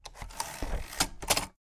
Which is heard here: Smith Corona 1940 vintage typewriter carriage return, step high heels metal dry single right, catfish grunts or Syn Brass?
Smith Corona 1940 vintage typewriter carriage return